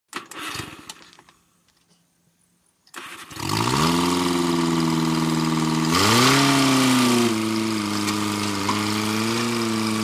VEHICLE - CONSTRUCTION JOHN DEERE 750: EXT: Start run with clanks, close up.; 750 Dozer